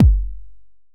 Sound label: kick drive